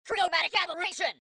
chromatic

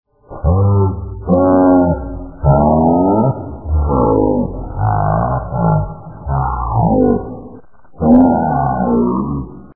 pig fail; vapesquared

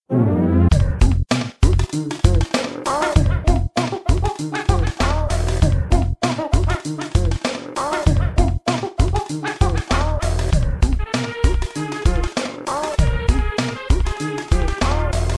stage clear diddy

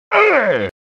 whomps

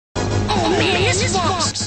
whomp; cave

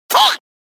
the sound iron knuckle makes; ocarina of time

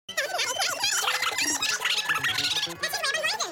unwinding

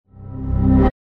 error, reverse

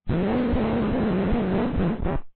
scratch; vapesquared

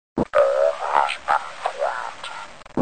bomb plant

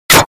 hit

